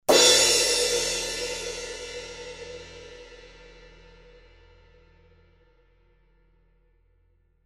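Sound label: CR ZHT 18 RC 18, crash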